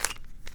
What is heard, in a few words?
Big Cube - Twist, Rubik's Cube